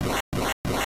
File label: slow spin; Super Smash Land